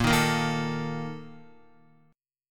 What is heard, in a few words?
Bb Major 7th Suspended 4th, Guitar; strummed; JGuitar